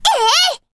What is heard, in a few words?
attack